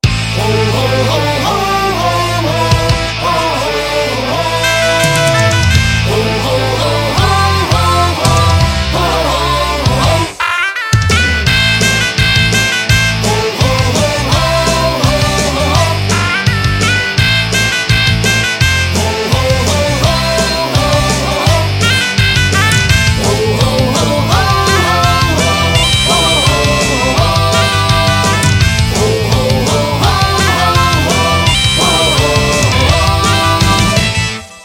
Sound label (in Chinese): 嗩吶